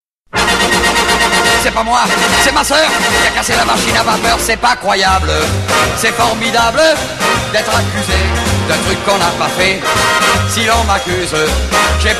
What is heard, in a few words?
rock rock rock